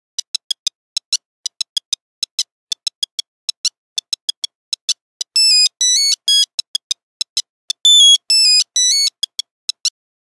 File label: Nokia Buzzer; Windows Phone apps; 8310 Side step